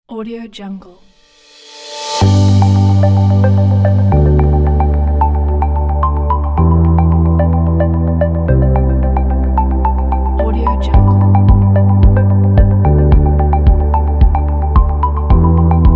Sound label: minimal inspiring pack; whiteenergy